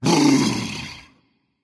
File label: tongue berserker; cuchulainn